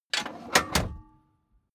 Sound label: car backseat turn forward